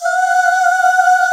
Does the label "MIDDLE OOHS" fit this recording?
Yes